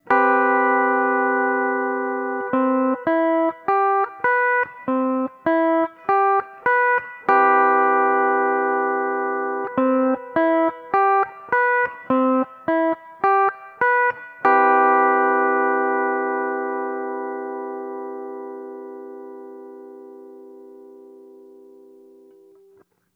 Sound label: cmaj7, steel guitar